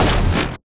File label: Transitions Low, UI Menu; David Dumais Audio